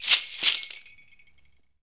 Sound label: SHAKER, Afro Shakers, Ankle Pods; Roland LCDP14 Africa VOL-2